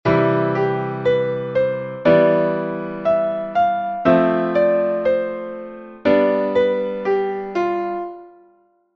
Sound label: melody chord